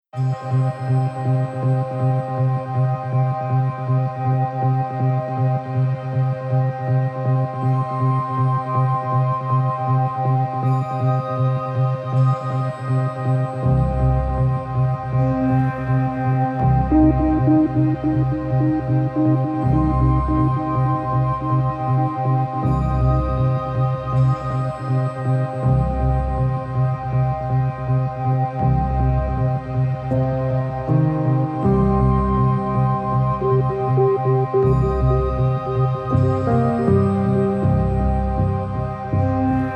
Dream Factory; Noisebiscuits

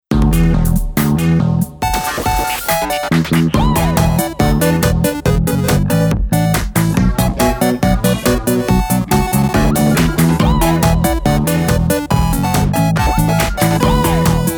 Bleep into Action; Noisebiscuits

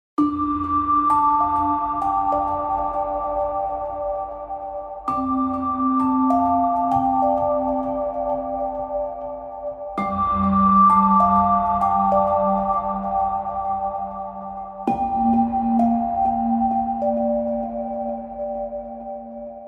Soft and Frozen; Noisebiscuits

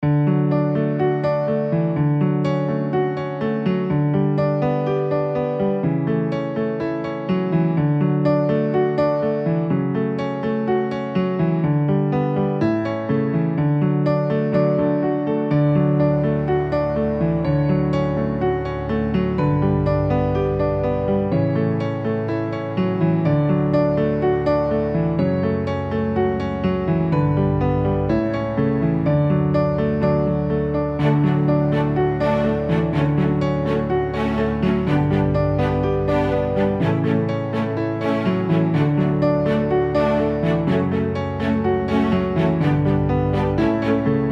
Babbling Brook; Noisebiscuits

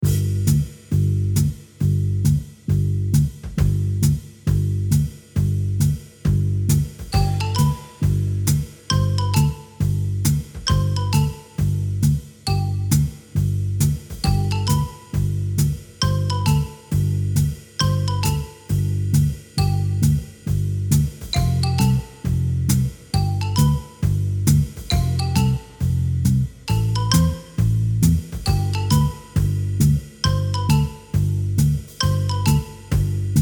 Sneaky Sneaky; Noisebiscuits

